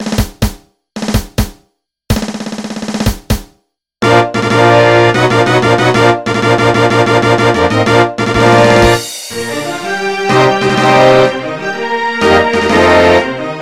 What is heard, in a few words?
Fanfare; 20th Century Fox